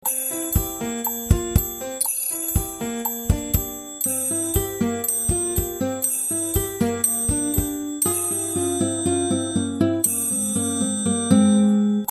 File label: Smooth Latin Afternoon